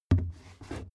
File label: step wood turn